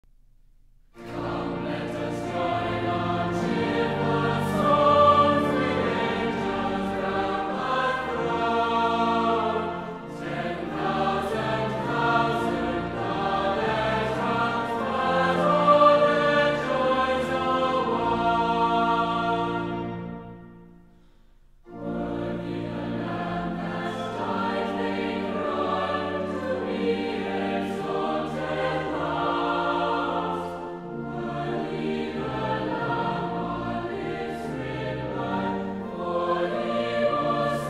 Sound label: choir